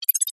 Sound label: science fiction robotic insect critter chirp